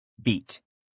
beat

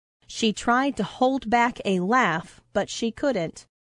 laugh; She tried to hold back a laugh but she couldn't.

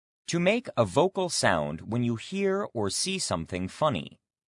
laugh; to make a vocal sound when you hear or see something funny

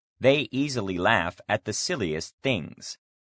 laugh; They easily laugh at the silliest things.

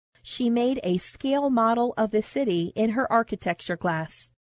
scale

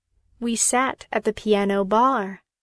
piano; We sat at the piano bar.

